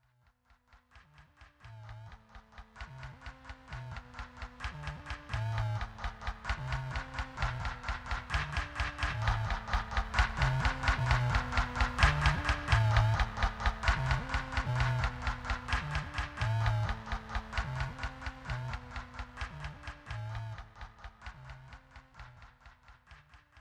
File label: Truck